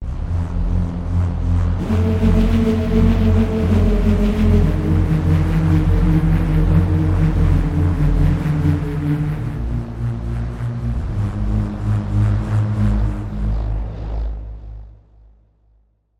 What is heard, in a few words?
BULL ROAR; eShop